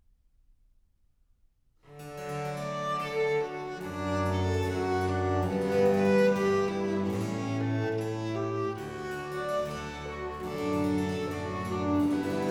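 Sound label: Sonata in G major, Larghetto- Adagio, Trio Sonatas; Handel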